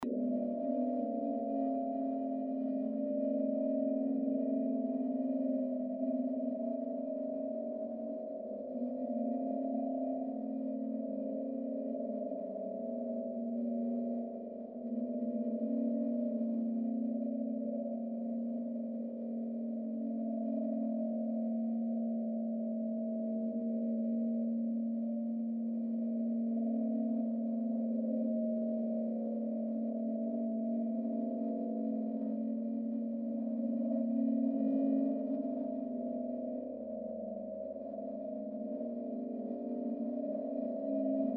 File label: electric gamelan WOLF WIND